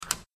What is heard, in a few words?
vend mach button